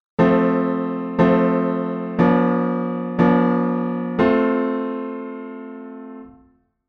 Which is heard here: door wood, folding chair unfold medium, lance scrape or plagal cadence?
plagal cadence